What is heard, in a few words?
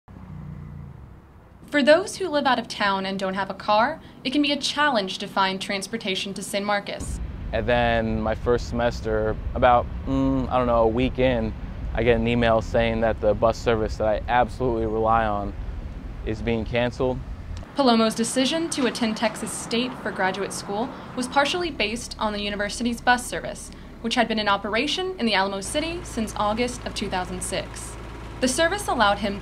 Tram